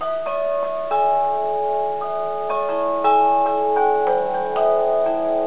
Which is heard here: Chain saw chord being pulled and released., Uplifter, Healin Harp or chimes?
chimes